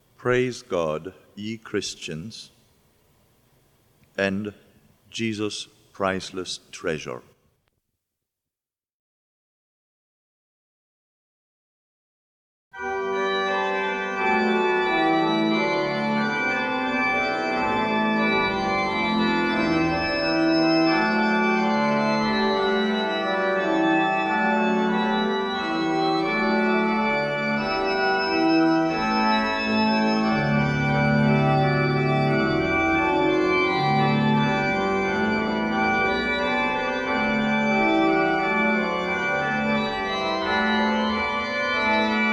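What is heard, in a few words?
Bach Orgelbuchlein, organ; BWV 609 Praise God Ye Christians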